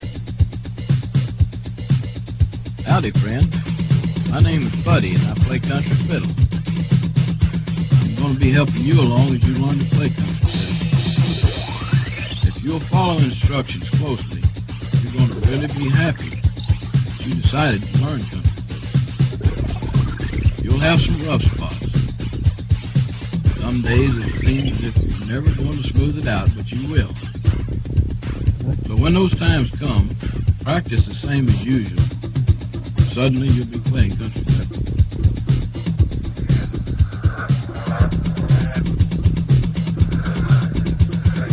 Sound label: country fiddle